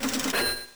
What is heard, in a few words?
buy; PokeRogue